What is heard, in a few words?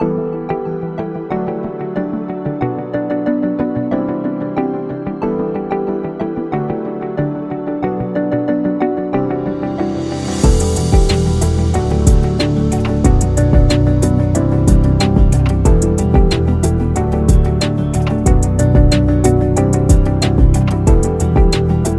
Inspiring Ambient